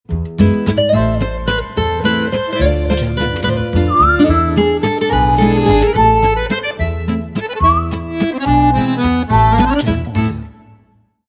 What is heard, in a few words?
French Logo